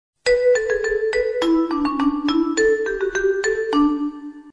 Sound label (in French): Melodie Vibrato